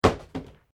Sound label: Cardboard box drop; Material components for constructing more complex sounds; Materials; SoundEffects Foundation2